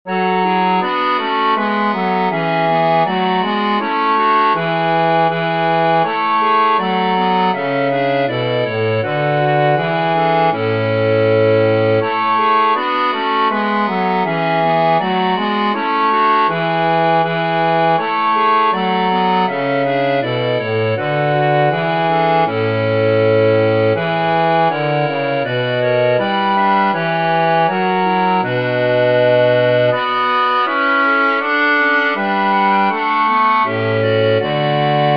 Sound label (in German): Bin ich gleich von dir gewichen Bass